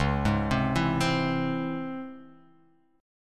Db Minor Major 7th, DbmM7 chord; DbmM7 arpeggiated; JGuitar

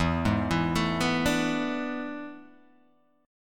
E 11th, Guitar, Open G

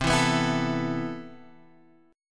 A Suspended 2nd C; strummed

